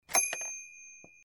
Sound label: collect Pack; cheesy-world-of-adventure; machuPicchu